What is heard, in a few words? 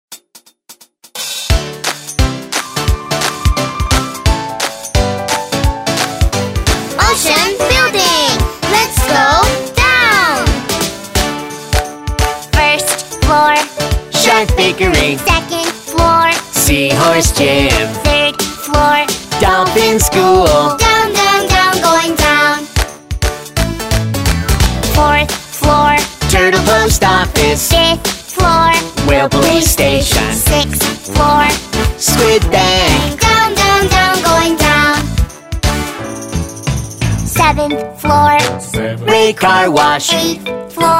english songs for kids